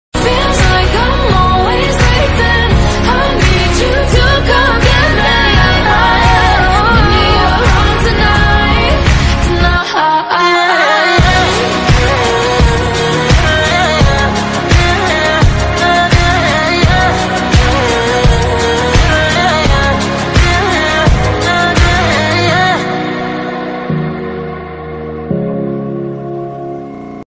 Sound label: bgm ringtone english ringtone love ringtone